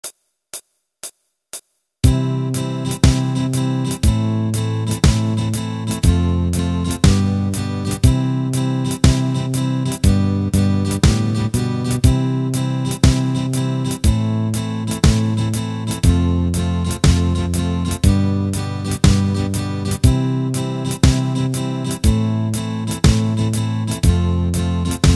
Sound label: greeting song instrumental